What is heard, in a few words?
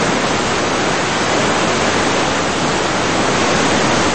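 waterfall turbulent close ringing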